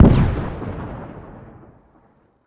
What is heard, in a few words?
frag grenade explode far